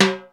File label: TIMBAL, PERCUSSION; Crypton - DrumBase5000